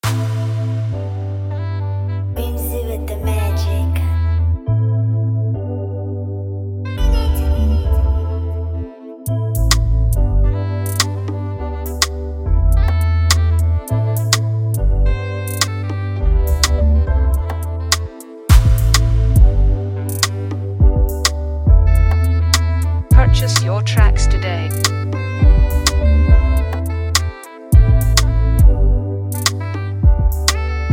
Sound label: Afrobeat Instrumental